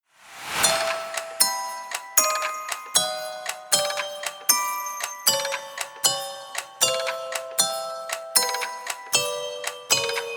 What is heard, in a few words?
Off Vocal